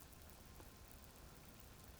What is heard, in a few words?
pulses, BALAENOPTERA; MONACO 2022-07